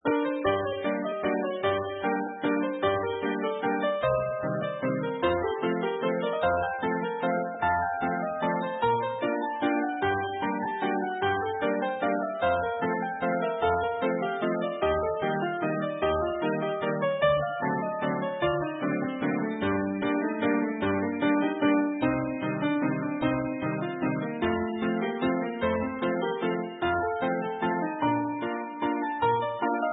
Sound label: Wings Bells